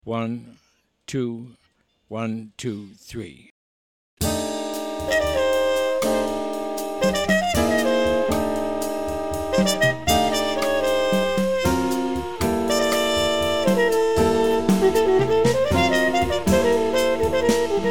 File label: minus bass